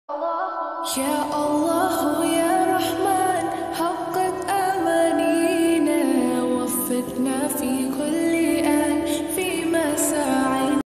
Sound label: slowed reverb ringtone